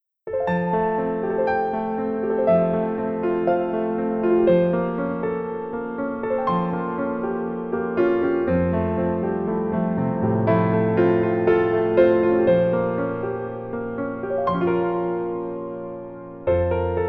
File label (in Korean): Piano ver 멜로디MR